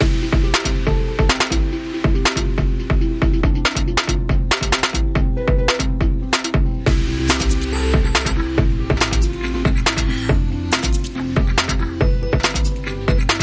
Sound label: Classical Fusion